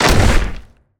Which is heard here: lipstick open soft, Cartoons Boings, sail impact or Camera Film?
sail impact